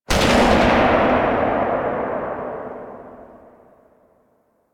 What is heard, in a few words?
Sniper Tail, Weapons; DE; Warframe; Tenno; Quad Sniper